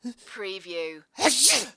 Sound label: Sneezing, Male Sneeze